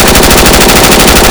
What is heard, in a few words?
Inf Weapons; Red Orchestra 41-45